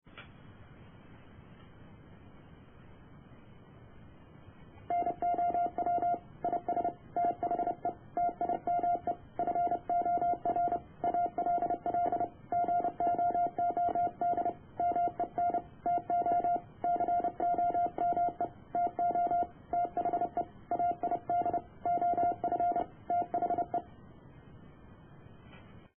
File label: Morse